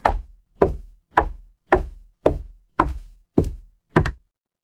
footsteps stairs

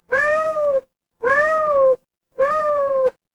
animal cat meow

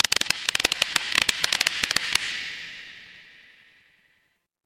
fire electric arc

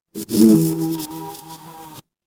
animal insect

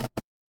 feedback error

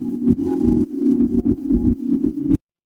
magic scifi alien

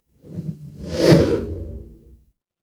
transition whoosh

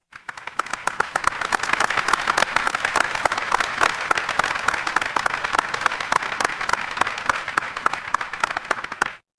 crowd applause

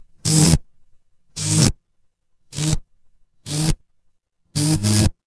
fire electric zap

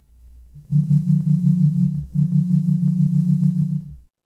office phone vibrate

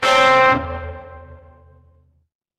transition braam

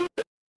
retro game text blip

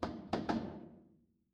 door knock

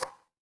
ui hover

